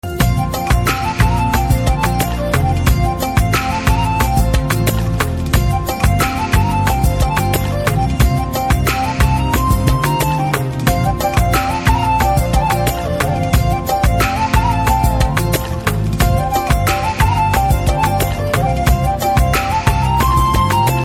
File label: Love BGM Ringtone, Telugu; Sulthan